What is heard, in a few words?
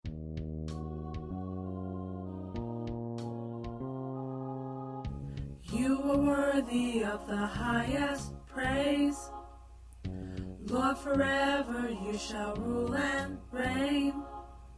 P Tenor